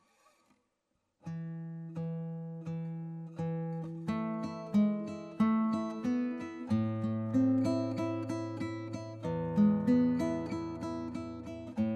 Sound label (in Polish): moderato, gitara